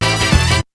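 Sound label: DISCO SHOT; BEAT2000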